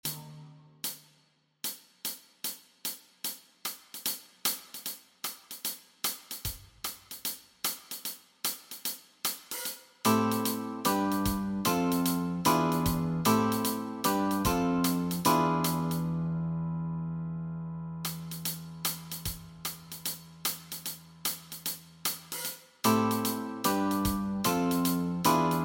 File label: Backing Track, walking bass